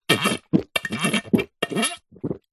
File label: human drink guzzle gulp slurp cola from plastic bottle; zapsplat